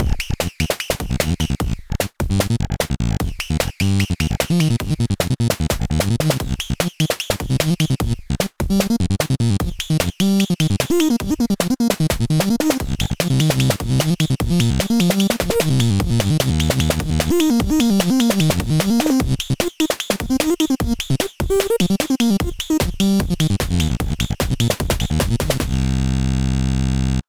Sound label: hour glass growler